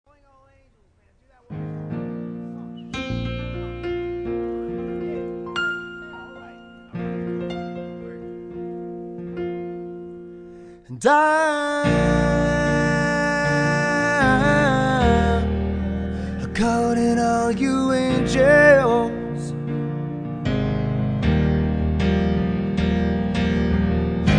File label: Piano Vocal Version